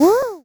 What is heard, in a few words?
vinyl scratch